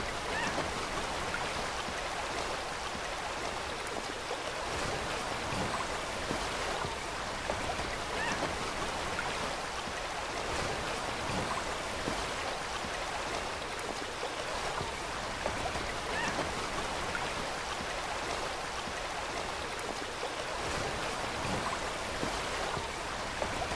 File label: forest creek